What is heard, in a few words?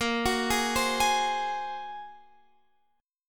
Bb 7th Suspended 2nd Sharp 5th Bb down Guitar Open F; Bb7sus2#5; arpeggiated; JGuitar